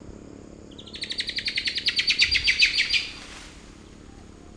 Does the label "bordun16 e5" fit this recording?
No